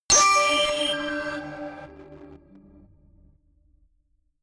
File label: notification glockenspiel crazy fifth